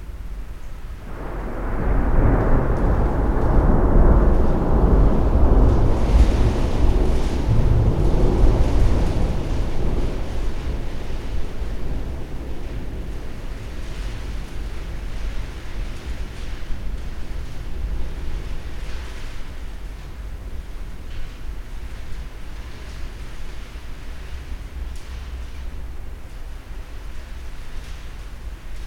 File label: thunder hamburg